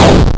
chandelier

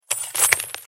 sound money; Monster Arena

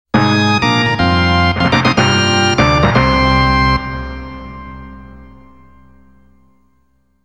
Stage Clear; Akumajo Dracula Kuro 2021

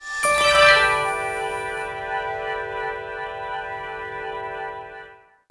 Puzzle Solved; rayman 3 hoodlum havoc gc ps2 windows xbox 2003

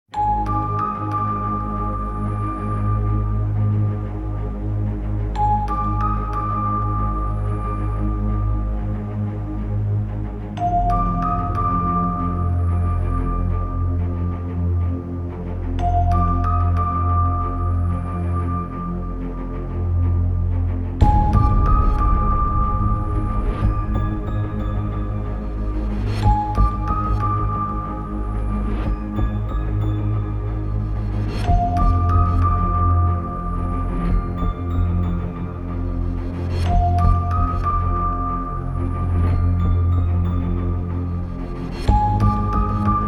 Piano; Kemono Friends Cellien May Cry; macos; windows

